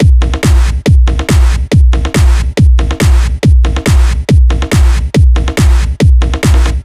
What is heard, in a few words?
Master Beat